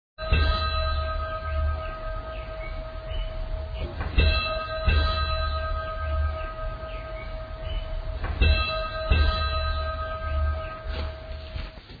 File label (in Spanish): Campanas